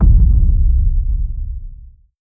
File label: planet killer hit; apocalypse